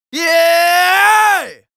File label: Yells, Viking Vocal